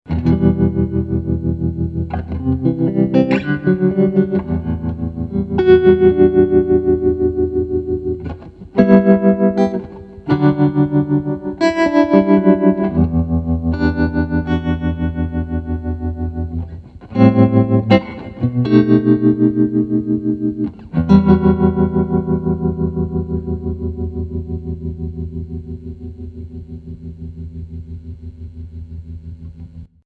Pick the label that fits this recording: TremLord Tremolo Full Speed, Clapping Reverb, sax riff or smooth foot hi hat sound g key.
TremLord Tremolo Full Speed